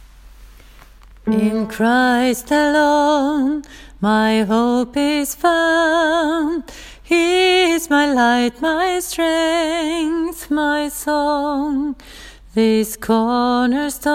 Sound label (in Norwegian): sopran; åfjord